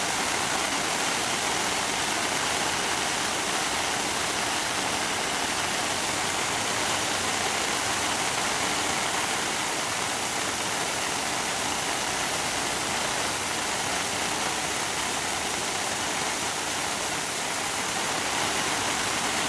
firehydrant loop

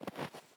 snow jump; teardown; ps5 windows xbox series xs

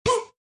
Pain hit guy

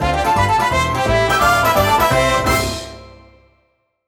Stage Clear Dance (Short ver.); Kirby Star Allies

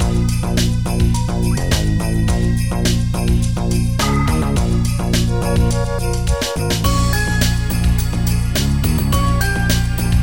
Base Loop; Mission in Snowdriftland

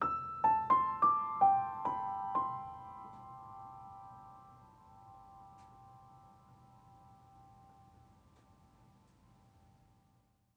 Music Level Argument Stinger; Florence

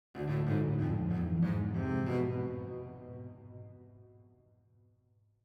Cello Riff 94bpm Loop With End Tail

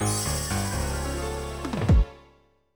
Balloon Go Pop! - Loss; My Secret World by Imagine; NDS

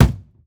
wood hit

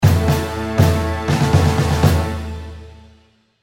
Menu Military End

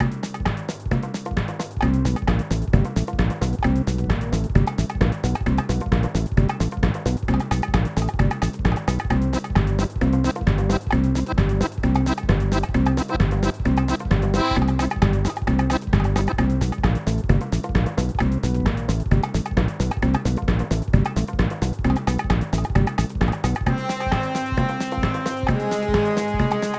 gameplay pirate music loop

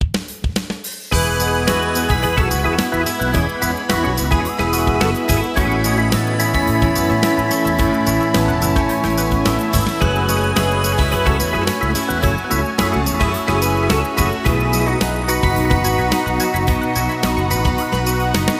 Music Game General event

